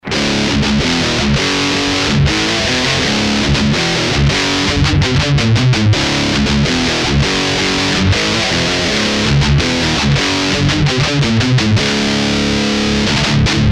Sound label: SANS AMP GT2